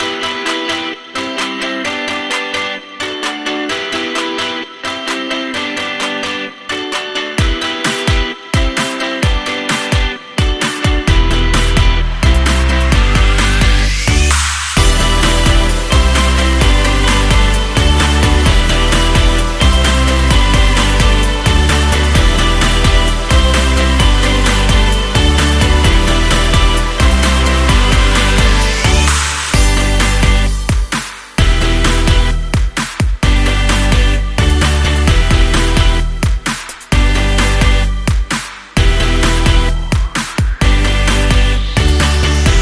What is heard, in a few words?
Upbeat Indie Pop, corporate music; corporate music vol 17